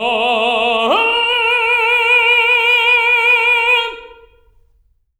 TENOR A#3, Voices; TENOR OCT

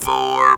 Talk Box LOW L

